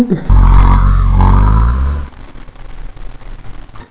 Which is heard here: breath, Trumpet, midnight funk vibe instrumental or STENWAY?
breath